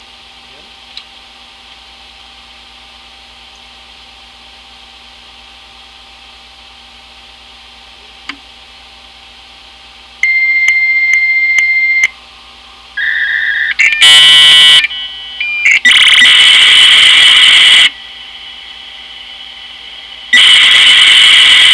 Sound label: failed